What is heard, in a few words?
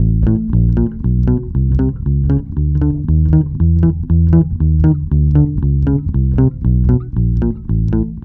BASSE; USB Soundscan vol.32; Roots Disco; AKAI